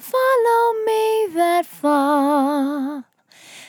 On A Star 65bpm F, Vocal; Zero-G

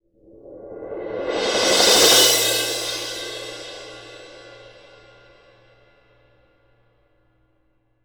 CYM, Percussion; ILIO; Synclavier Percussion 2; World & Orchestral

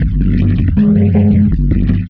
Morph Bumpers, Fx

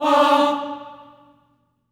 Staccato F D4 R, Symphony of Voices; Spectrasonics

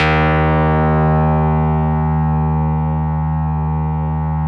RESMET2 D#2, Yamaha VP-1, RESO METAL 2; Music Store; 90's Sample CDs

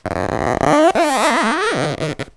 Wet finger on book, cartoon Foley Fx; musicradar; cartoon caper

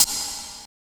snare+hat; DRUMS

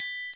TRI, triangles; Univers Sons; Basicussions

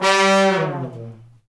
brass fat falls; Roland LCDP06; Fat Section

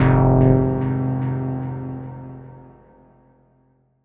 stab dubber F, Noise; stabs

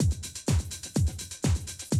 125, Classic Drum Loops

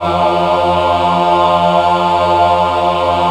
F2 MW AH, Choir; USB Soundscan vol.28; Acoustic & Synth; AKAI

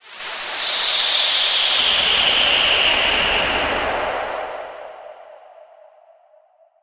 Sweep; hiss crackle

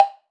Block, cartoon caper, Percussive; musicradar

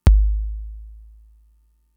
kick, Fricke MFB-522

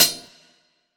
Lo-Fi Metallic Hi-Hat; GERUDOCLINT

